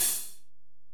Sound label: HiHat, Alesis DM-PRO